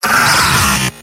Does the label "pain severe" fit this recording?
Yes